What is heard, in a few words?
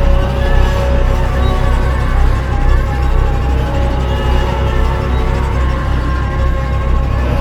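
Shock; MiSide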